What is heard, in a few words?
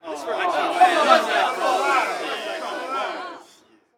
street ball crowd boo; SFX Kit; Crowds